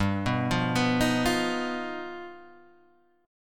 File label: G Augmented 7th; G+7 Chord {3 2 3 4 4 1} arpeggiated